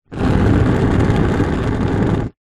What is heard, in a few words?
nature fire flame air wind blow blast fast short